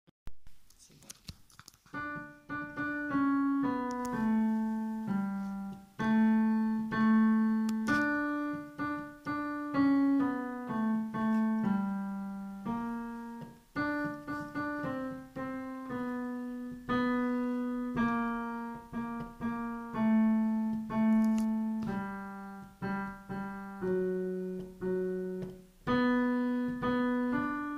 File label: piano